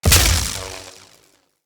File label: Voltaic Sword Projectile Impact; Characters; Enemy; Abilities; Sinecure; Banneret